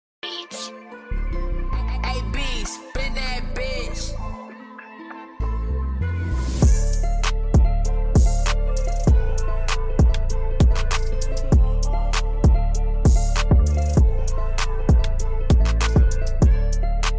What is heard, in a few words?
type beat ringtone